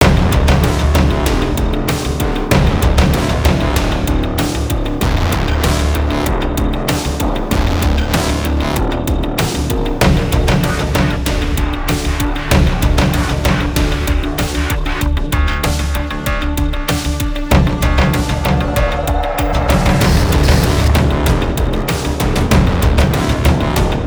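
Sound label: Music Electronic, Electronic Pre Race 96bpm; Music Elec 1#4 (Pm Elec Pre Race 1 96bpm Game Mix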